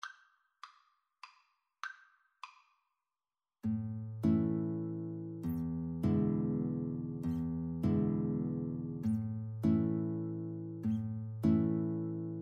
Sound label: happy birthday Violin Guitar duet